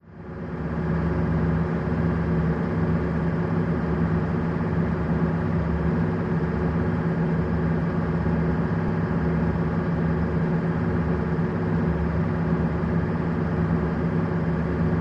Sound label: Military Pbr: Onboard Medium Speed, Steady. Dual